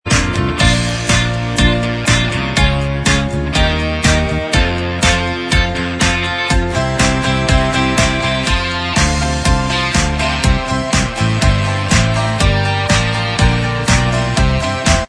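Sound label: medley, instrumental track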